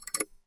loading tick, progress

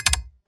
enter, keyboard

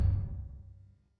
logo reveal, impact

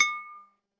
sparkle, magic

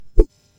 whoosh, transition

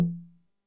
drop thud, impact

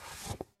slide in, transition

